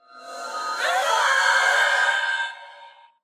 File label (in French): Banshee Cri; Phasmophobia